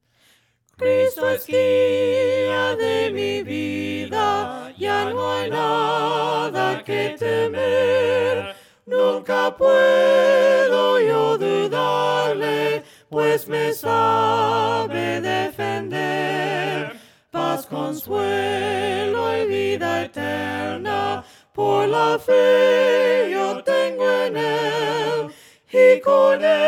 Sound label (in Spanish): VOCES, himnos a capella; cantos del camino; CRISTO ES GUIA DE MII VIDA